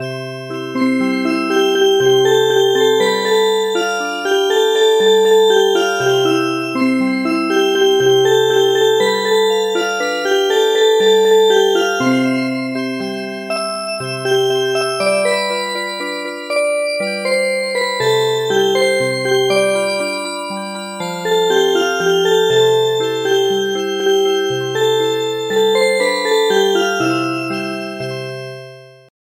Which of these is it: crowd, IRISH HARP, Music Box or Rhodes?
Music Box